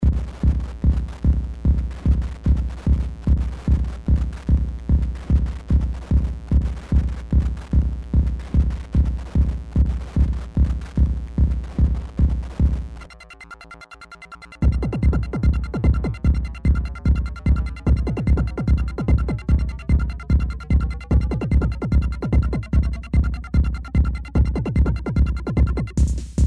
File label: noise